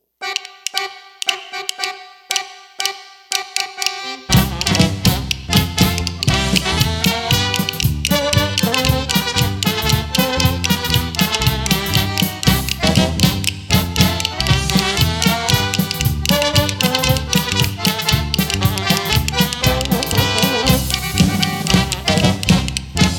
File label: Polka